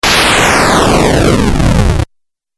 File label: Fireball Video Game; Twistedtracks